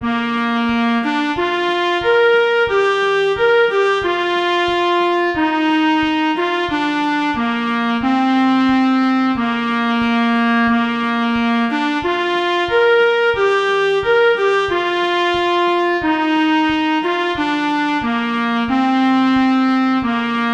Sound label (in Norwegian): klar trp sax